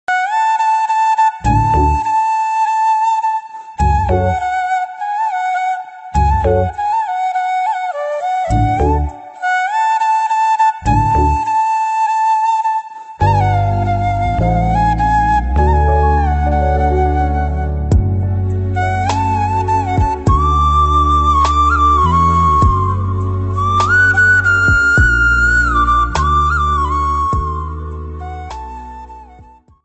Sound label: flute bgm